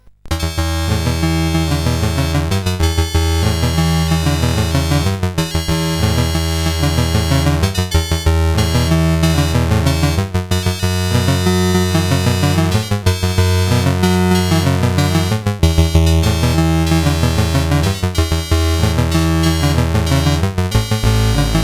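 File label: Magicians Ball, MOS 8580 SID chip music, games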